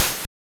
mod hat, Synths and FX, Mod Drums; neuro; Sinistarr

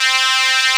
PWM HP50 C3; Analogon

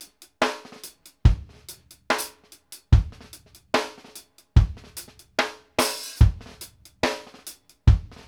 KICK, REGGAE 72; Sampleheads; New York City Drumworks VOL-1

